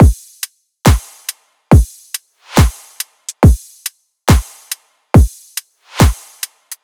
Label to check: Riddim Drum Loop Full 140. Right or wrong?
Right